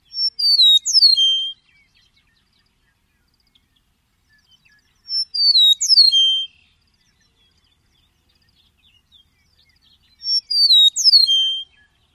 song

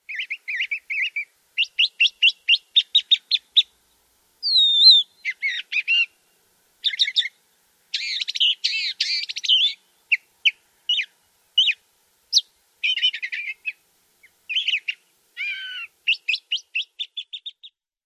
song; AZ

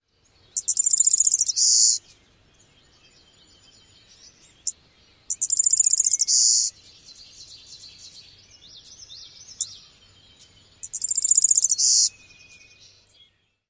dawn song; NY

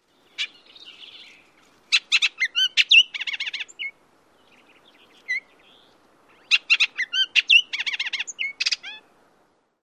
song

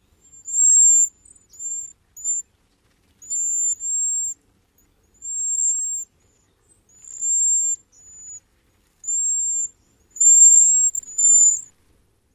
seee calls; NY

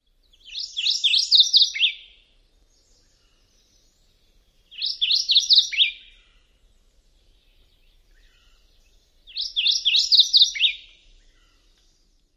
songs

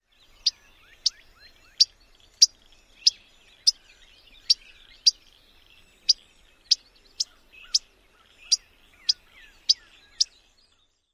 female chips alarm

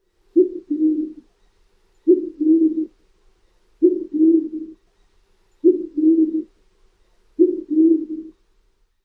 song

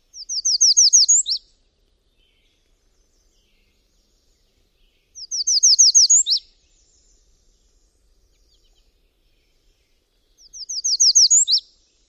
songs

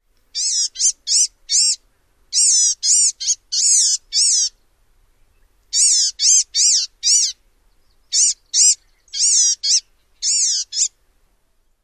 calls

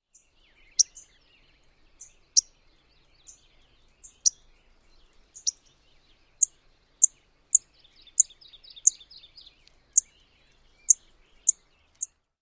alarm chips

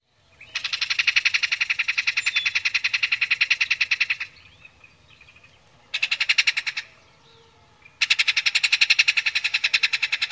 calls; TX

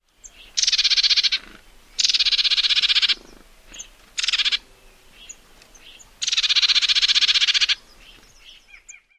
calls

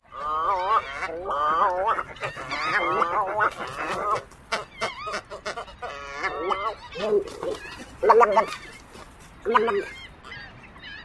colony sounds

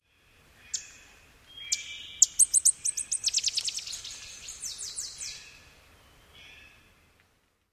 flight song, WOEAWA

